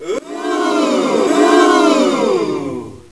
DEAT; DOOM

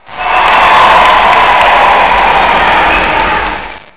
CHEERING

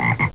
ribbit

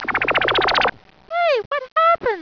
TRANSPRT

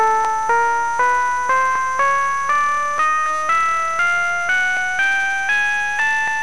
A scale

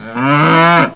COW

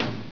impact

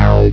BASS

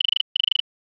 ring

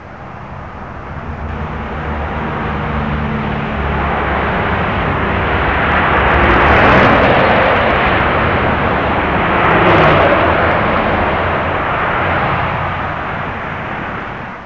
highway, car sound

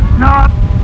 GRARF

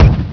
shield

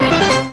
COMPLETE

